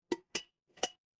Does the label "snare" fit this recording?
No